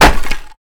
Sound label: wood solid impact bullet; physics